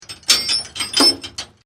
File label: Chain Metal Clanging